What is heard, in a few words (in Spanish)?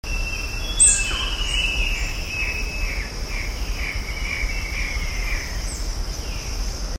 sonidos ave del paraiso imperial Paradisaea Guilielmi